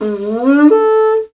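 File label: Afro Horns, horn phrase; Roland LCDP11 Africa VOL-1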